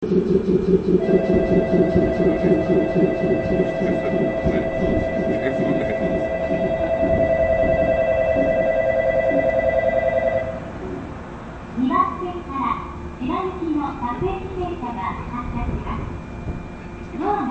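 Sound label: honchiba, departure bell; sotobo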